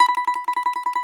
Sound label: BAGLAMA T1BR; E-MU Producer Series Vol. 7 – Old World Instruments